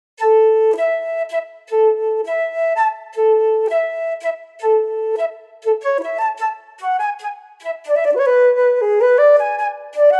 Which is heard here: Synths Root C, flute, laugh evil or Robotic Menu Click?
flute